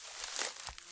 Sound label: Rustly Step, Footsteps, outdoor